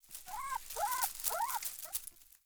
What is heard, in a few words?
Beaver Idle Chatter; Encounters; Animal Rescue